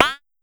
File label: Pollard Perc, Syndrum